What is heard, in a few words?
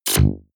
sci-fi shock squeeze